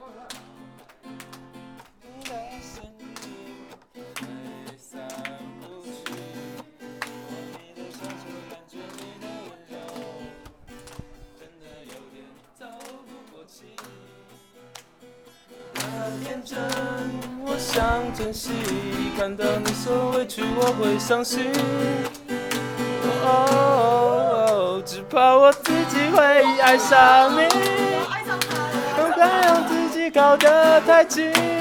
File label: singing; soundscape